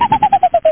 giggle; jinx

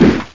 appear

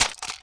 weapon hit; Soldat

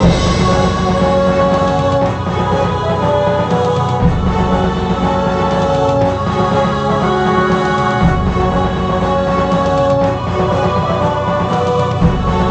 music combat loop; combat II clan

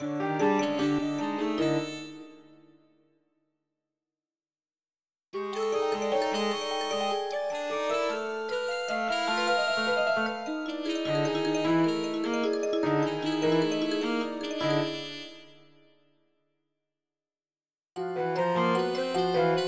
midi; Symbolic Composer 4.2; MIDI Music File

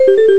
new mail; FirstClass

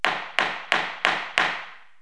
Gavel (Multiple Hits); Wild and Wacky Sound Software; 1,000 Sounds; City Life; Law & Order

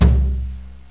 brush tom 4; Drums; XG; GMPlay; Brush Tom 1

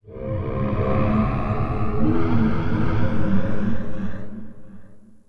Voices

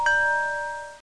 mouse-down; xRes 2.0 SE

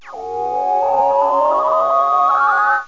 prophet5 space swell, synths; Zoom - Release 2 (1996); Active Software

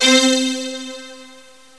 string; Descent

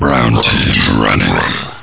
run; Duke Nukem 3D Kill-A-Ton Collection

